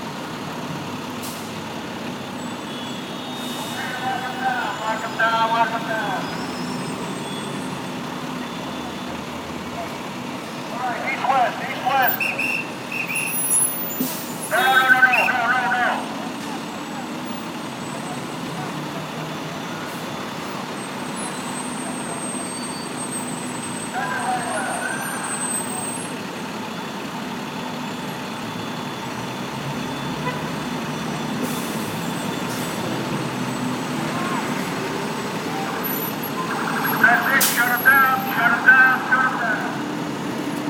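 EXTERIOR AMBIENCE New York City Emergency Response; Studio238; Ambiences 1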